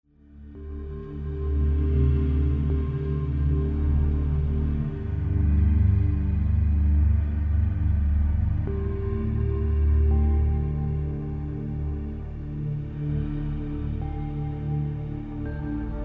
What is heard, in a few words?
Music for Brainwave Massage Scientific Sounds